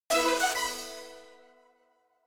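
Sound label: Jingle Item Get; Ring Fit Adventure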